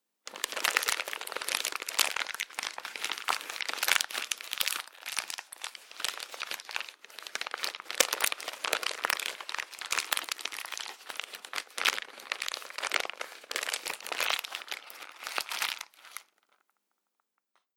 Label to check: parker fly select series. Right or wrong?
Wrong